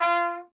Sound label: F Trumpet